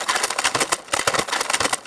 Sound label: Paper Bag; Organics